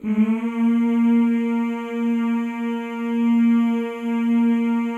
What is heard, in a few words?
male; Spectrasonics; Symphony of Voices